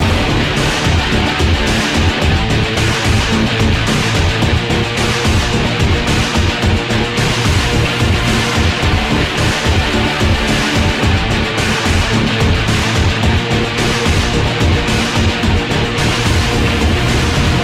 theme loop; slender; gunner

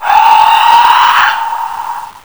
scream, horror; slender

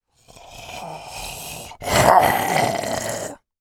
alert; slender; zombiebm

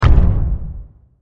step; slender; guardian; foot

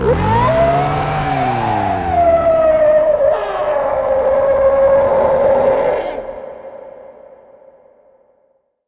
saw hello; slender; cof

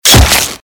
attack claw hit; slender; amnesia

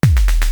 pan impact world; tron mod; weapons

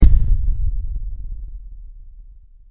step; slender; hellknight; foot

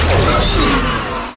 spy uncloak feign death; tron mod; player

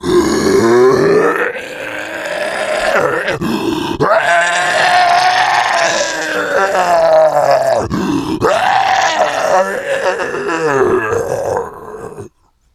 moan; slender; zombiebm

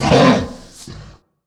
attack; slender; garg

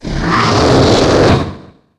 roar; slender; garg

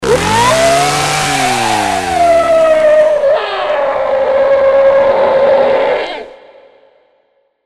saw alert; slender; cof

